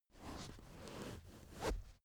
car armrest backseat close slow soft

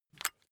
rock drill head remove heavy; bohrer; soundpool

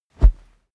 whoosh cloth low soft

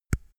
microphone pop off soft; objekte

